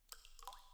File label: spill